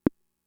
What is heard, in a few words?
Conga, Fricke MFB-522